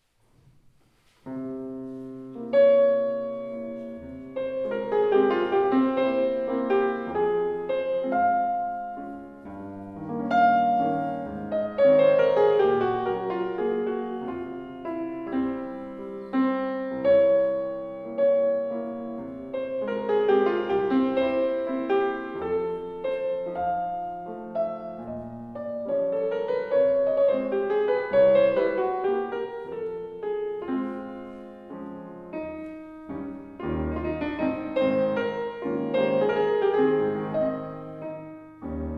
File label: Mazurka; Chopin; Op.63 No.2